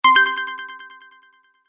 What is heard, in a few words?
Short Multimedia Alert Effect, Chime; Chime Sound Effects; Chimes (Sound Effects:Multimedia); Stuart Duffield